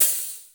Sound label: DATA HHOP, GATE KITS; Crypton - DrumBase5000